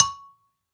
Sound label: Flower Pot Hard; Flower Pots